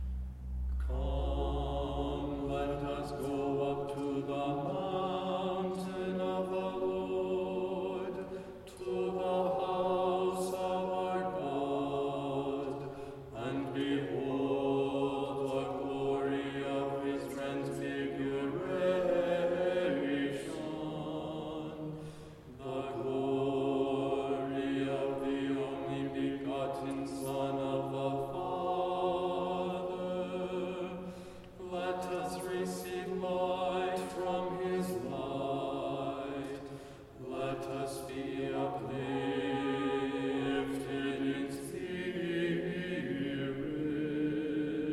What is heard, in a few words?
Tone 5 Kievan Chant Sticheron Example Bass